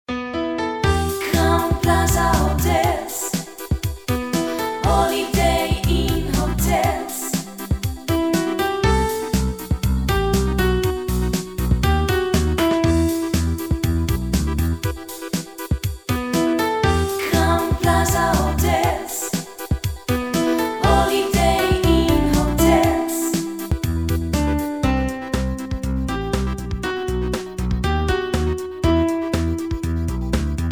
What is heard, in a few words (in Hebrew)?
גינגלים